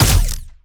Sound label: Gunauto Plasmid Machinegun Single